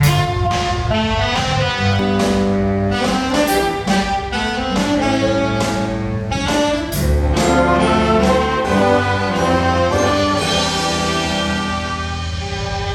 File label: Jazzband